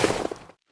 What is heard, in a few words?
snow, footsteps; Counter-Strike Global Offensive